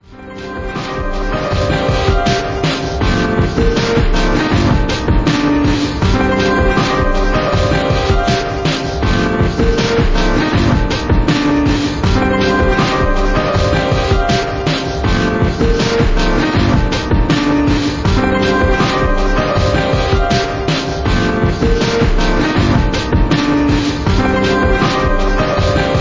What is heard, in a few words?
Piano